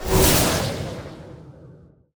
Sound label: light shoot